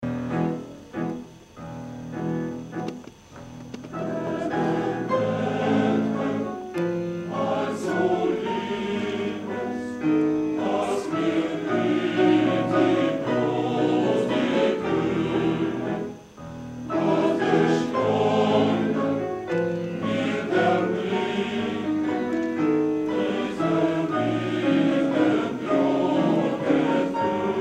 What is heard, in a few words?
male choir; male choir of mephi; ershovo